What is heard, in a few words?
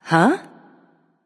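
confused, female; smite; voicepack; hera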